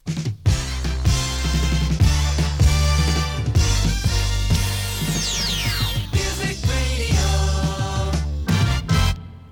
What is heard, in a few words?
topformat maxi jingle single